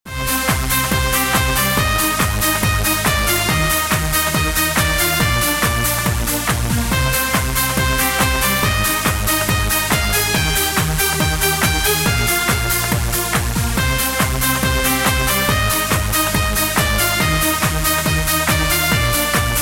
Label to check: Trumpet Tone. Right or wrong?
Right